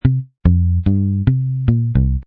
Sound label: Bass Riff A# 110, Bass riff in A# at 110bpm; Royalty Free Bass Loops; Music Production Loops